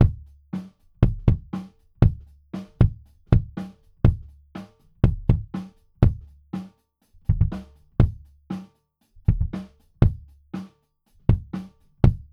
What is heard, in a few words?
kick; crowley and tripp; el diablo